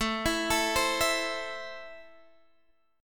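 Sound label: A Minor, Guitar Drop D, chord strum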